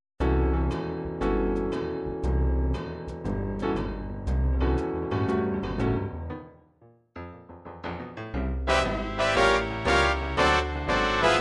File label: swing, midi